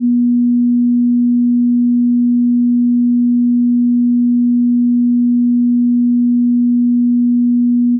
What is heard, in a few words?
depth charge; subsurface